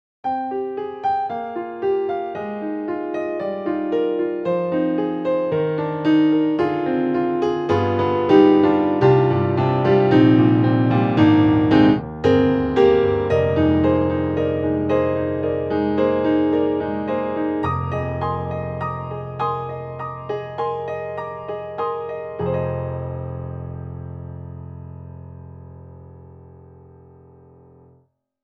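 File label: Ravenscroft 275 Player Mic, piano; VI Labs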